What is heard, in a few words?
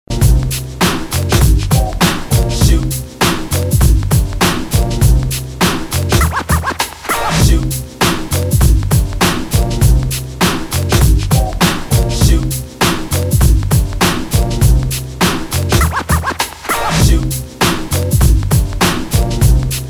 Classic 90s ReDrum